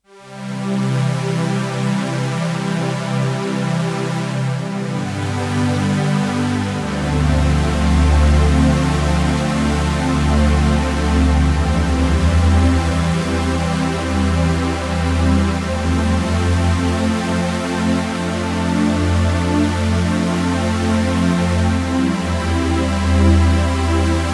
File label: Fat pad